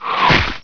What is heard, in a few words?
SPIT; HEXEN2